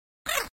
monster death; Crypt of the NecroDancer

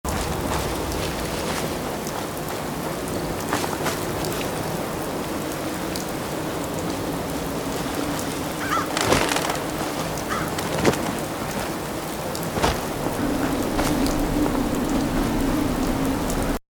weather rain; fallout 4

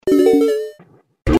arrived at ship; pikmin 3

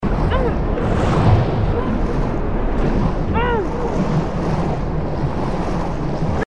lara diving; rise of the tomb raider

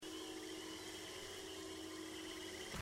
ambient; risk of rain 2

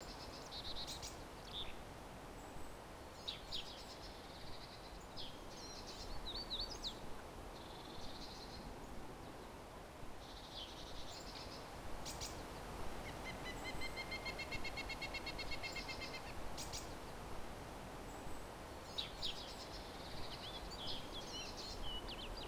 ambient forest; dayz standalone

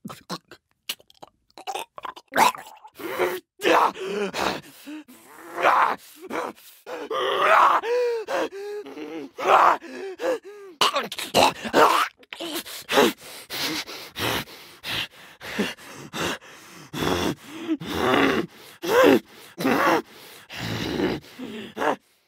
male runner; The Last of Us Part II

